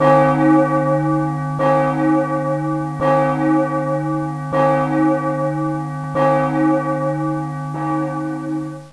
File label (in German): kirchenglocke